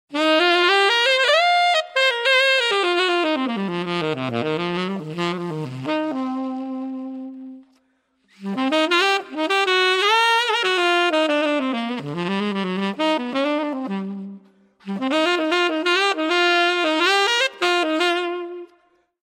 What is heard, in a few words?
Saxo Play